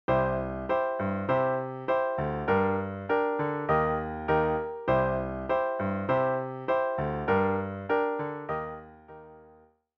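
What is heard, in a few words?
vamp, piano rendition of the chords, C Gm Eb; song intro; 3-Chord Vamps; Gary Ewer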